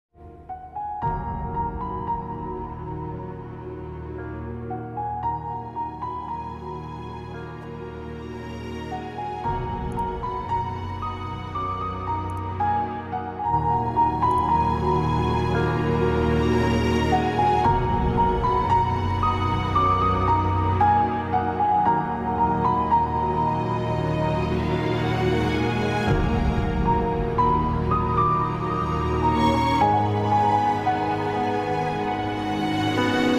drama ringtone music ringtone pakistani drama ringtone